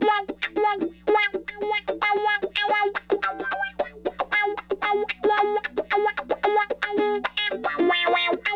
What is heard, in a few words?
Raw Funk Guitar